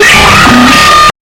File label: jumpscare; insanity